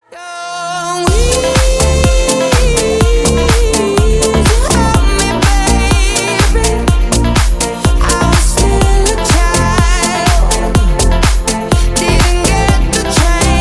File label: remix